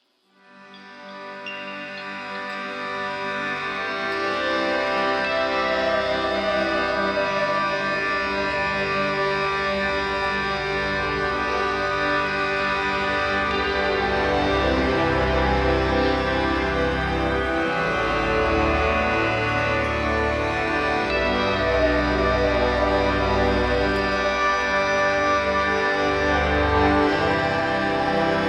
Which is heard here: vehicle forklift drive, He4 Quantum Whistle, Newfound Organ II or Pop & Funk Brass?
Newfound Organ II